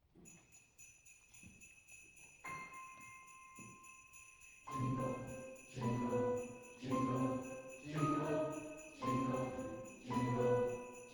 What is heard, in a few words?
Variations on Jingle Bells